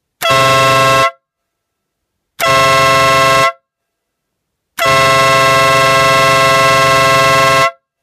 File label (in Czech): klakson 2 tonovy